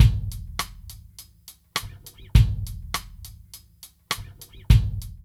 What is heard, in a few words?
LOOP